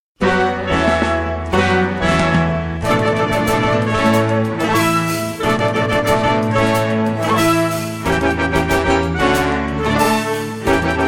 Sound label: Batida